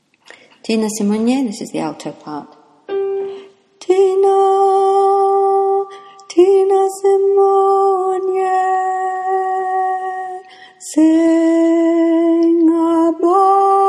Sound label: alto